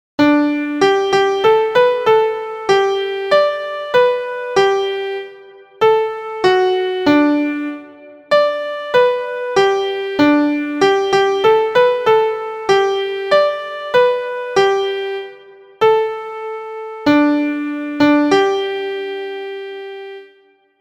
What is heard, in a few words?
MIDI